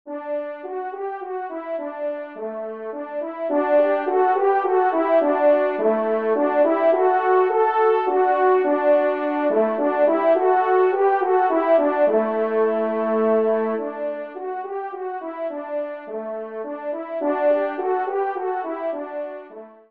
Menuet Do Maj TRP; 2e Menuet; Corrette

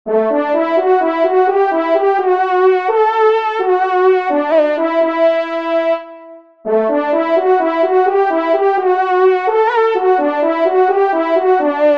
solo, trompe; Solo Trompe (Ton de vénerie); Localisation : France; La Bernès; Henri Carrière; à mon ami Bernès

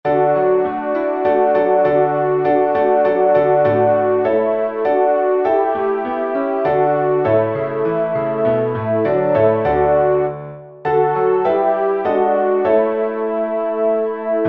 Offertoire Trp et Pia; Messe d'Estival

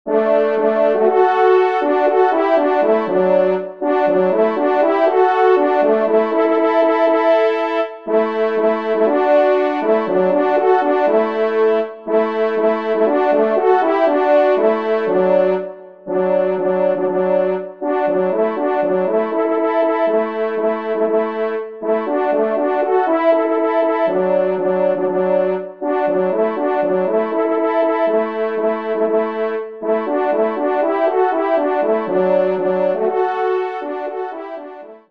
Retraite, trompe; Retraite des Chasseurs; 2e Trompe

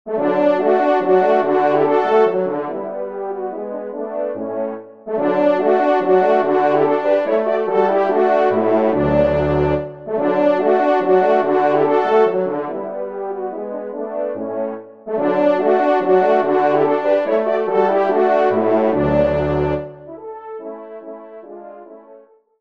Trio Cors; Jean-Joseph Kenn (1757-1840); Trio de Cors ou de Trompes de chasse

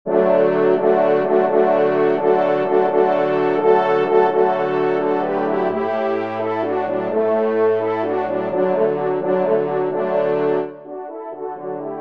Air de Chasse Cor Re ou Trompe; karl czerny

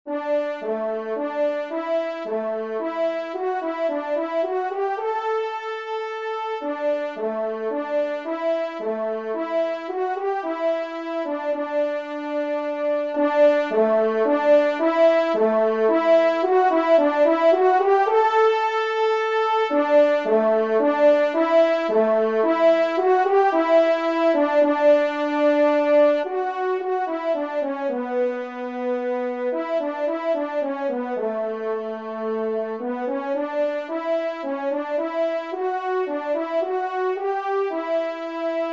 menuet, trompe; 1e Trompe; Dominique Dupoiron

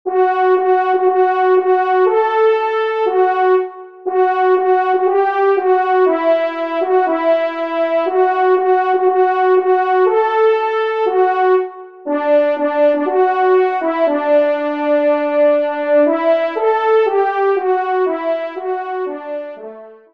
trompe; Benedictus; Messe d'Estival

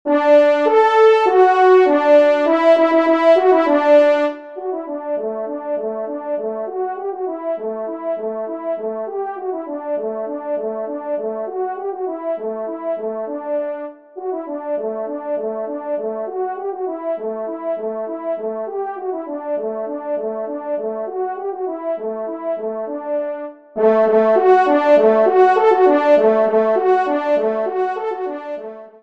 trompe; Le Veneur

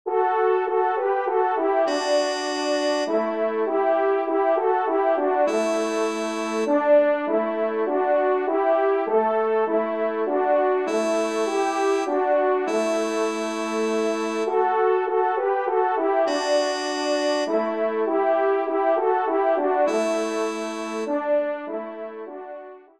Menuet Do maj, trompe; Corrette

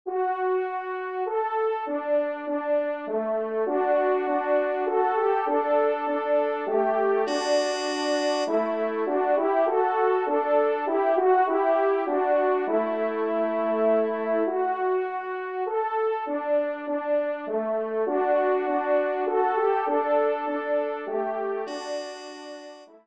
Menuet Do maj, trompe; Corrette

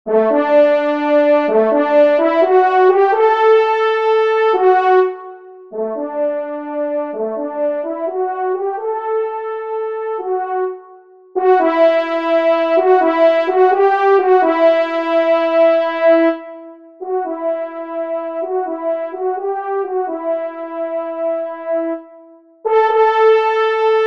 Valse des Chasseurs, trompe; 1e Trompe; P Bouillon; Auteur : Paul Bouillon; Dédicace : 1883

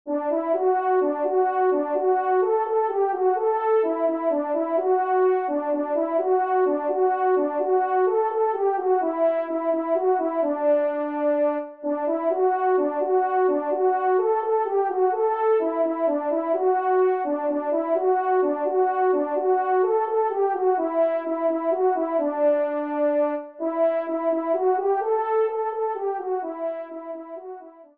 marche, trompe; 1e Trompe; Marche de Venerie; Auteur : Jules Millescamps

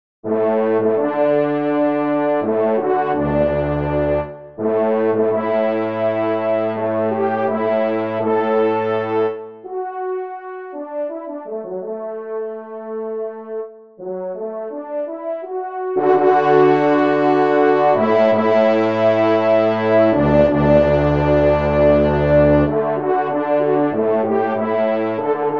trompe; Nini; E. Choureau; 5e Trompe

